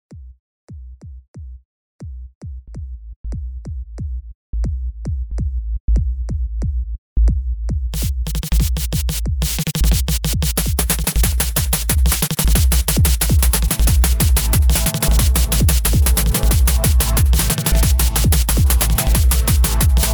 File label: Sick Drummer